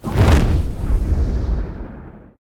fire, combat, abilities, big claw

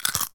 crunch

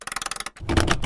clunk

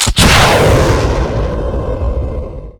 move, combat suit

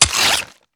swipe

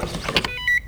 vehicle swipe id; CosmicRage; CosmicRageSounds

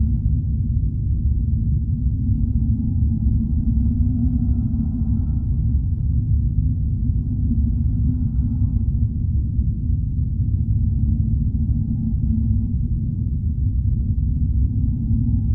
mining tunnel, ambiances

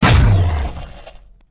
hurt, cylarkan, creatures; combat; CosmicRageSounds; larkan sound improvements

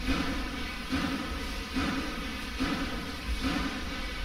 crush, factory; CosmicRage

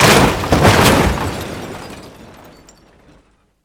vehicle ram

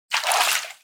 fish catch, fishing; activities fishing

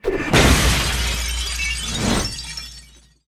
break me; CosmicRage

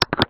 unlock

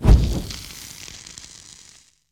erupt, hand mining; CosmicRage

